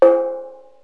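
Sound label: small gong, cartoon; Wired for Sound Pro CD; Aristosoft, Inc.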